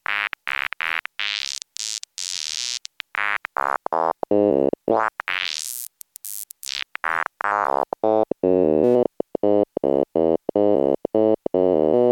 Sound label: formant